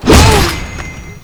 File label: hit; slender; ptsd; kaz soldier